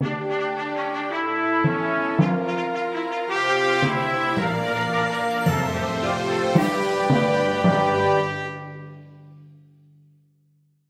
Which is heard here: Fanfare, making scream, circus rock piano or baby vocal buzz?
Fanfare